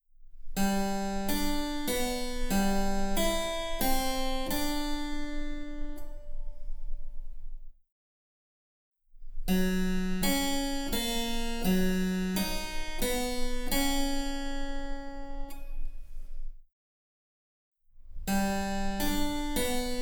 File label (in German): Cembalo; Mark Lindley WTC 1